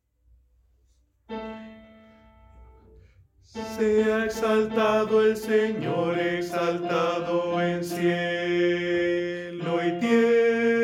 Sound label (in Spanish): Tenor, coro; Sea exaltado